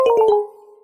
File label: error app